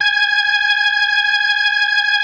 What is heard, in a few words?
G#5F, B3, Vintage Keyboards